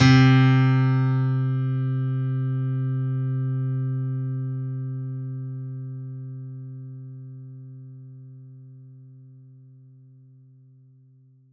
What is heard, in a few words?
korg triton acoustic piano c3